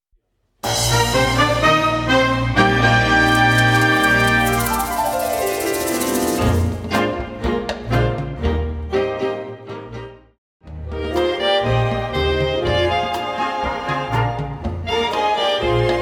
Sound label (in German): Rumba